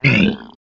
die; creature

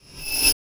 Rev Sweep; FREE SFX PACK VOL 1

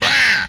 hit, vocal; retro arcade; MMRetroArcadeSoundsPack

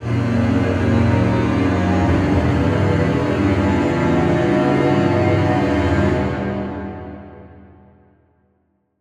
Suspense Strings Low, horror strings

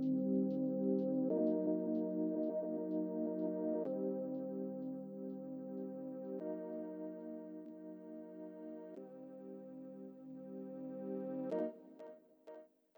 Wibble Pad

